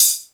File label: HH, Dance Mega Drums; Best Service; HH-HIP